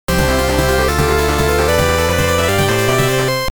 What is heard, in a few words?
victory; famitracker